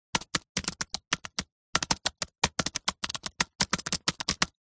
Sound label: computer keyboard apple mac magic typing short sequence